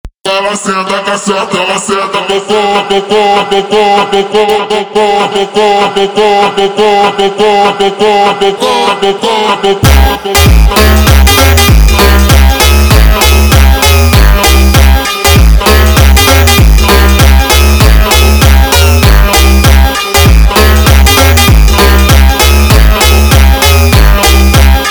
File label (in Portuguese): Montagem